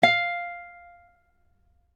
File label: dial tone guitar; Oppo R7